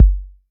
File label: BD 808